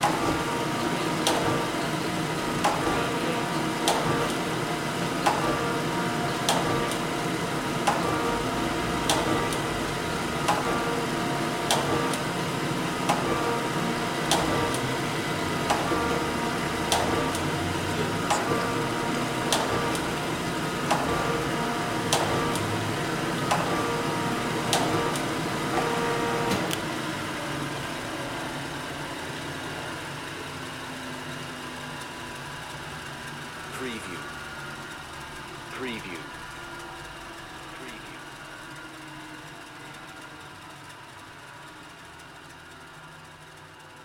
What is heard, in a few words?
Grinder